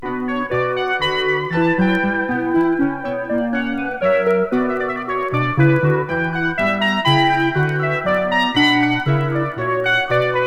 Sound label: Minuet; Selections From Suite No 2 in B Minor